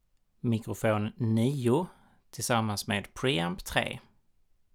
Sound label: mic pre